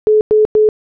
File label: phone call